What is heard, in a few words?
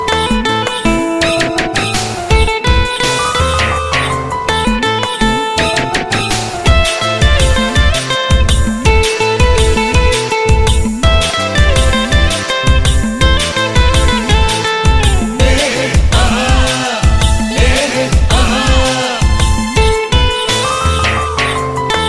Guitar